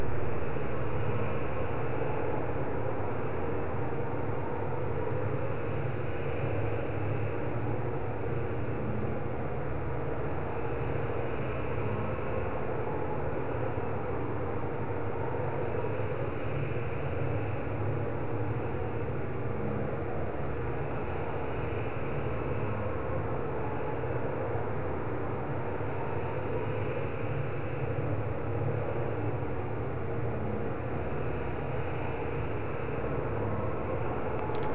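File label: portal ambience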